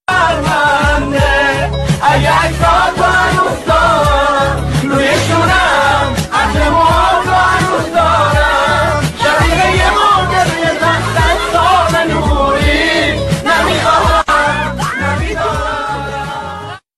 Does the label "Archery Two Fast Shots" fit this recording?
No